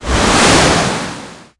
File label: Pirate Ship Appear; CaptainIce